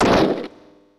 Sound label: Box Crate Break; Super Smash Bros